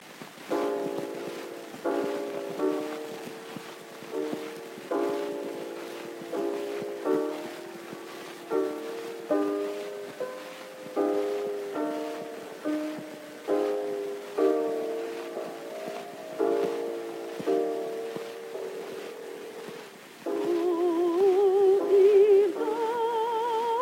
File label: Gramophone